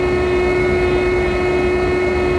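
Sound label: platform move loop; movers platforms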